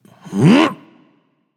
grunt lift; smite; apollo

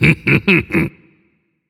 heh heh; smite; zhongkui

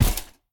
footstep; Smite; god Lancelot

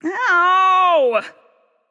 NV Ouch; smite; awilix

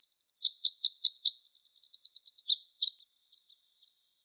ambience good bird; smite; conquest

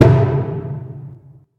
fire; smite; god raijin

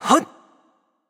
grunt jump; smite; mulan

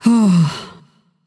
sigh; smite; voicepack; medusa

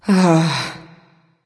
nv sigh; smite; awilix

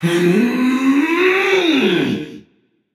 battlecry, voicepack; smite; jorm

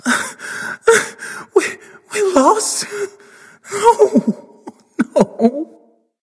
boohoo; cuchulainn

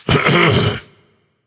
clear throat; smite; anhur

